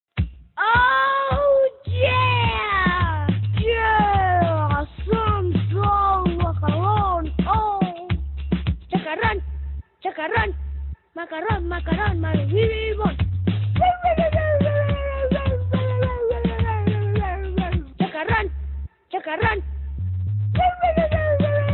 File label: dog; Voicemod